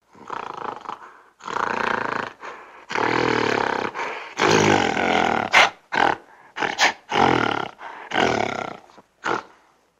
zvuk izdavaemyi goriloy

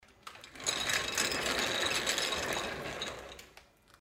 ofisn stul

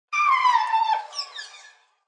tapir, zvuk tapira

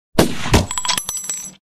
vistreli; smeshnye zvuki dlia montaja

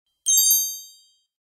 dotronulas volsh palochkoi

